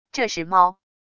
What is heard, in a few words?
cat, 猫